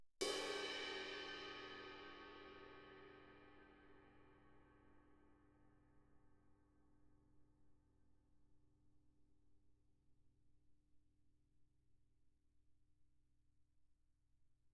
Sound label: crash stick shoulder mf, suspended cymbals; Percussion